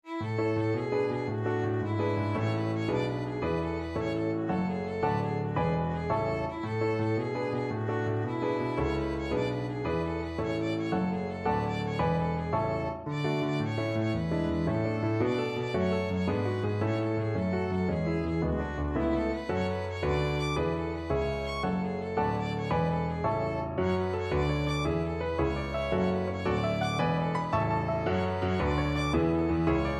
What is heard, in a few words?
Violin Sonata Rondo opening